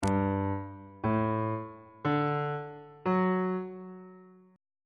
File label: g major 7th suspended 2nd chord intervals on bass clef